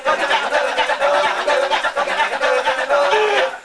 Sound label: Kecak; bali